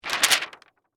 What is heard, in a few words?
Cellophane Move, Foley, Gel, Movement, Cellophane, Color, Correction; Crackling; Blast Wave